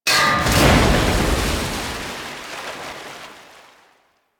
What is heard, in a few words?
Huge Tank Explode; Effervon; Warframe; Ambience; Nineteen Ninety Nine